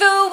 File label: vocal